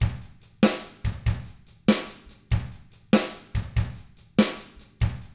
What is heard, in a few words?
ROCK 6/8, Drums; ILIO; Double Platinum Drums 1